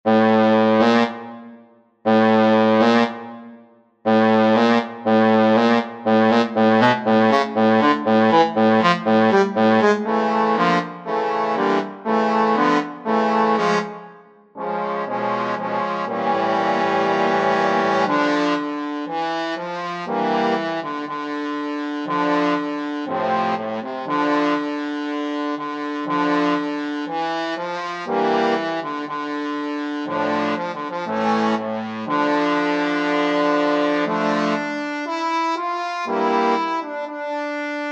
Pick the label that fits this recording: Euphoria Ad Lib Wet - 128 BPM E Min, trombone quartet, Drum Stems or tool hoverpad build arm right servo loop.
trombone quartet